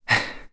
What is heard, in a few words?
Recover Lower; Link recovering from lower stamina; Skyward Sword; zelda